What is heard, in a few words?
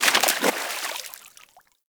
Forest Fish Jump Water; Props; Warframe; Grineer Forest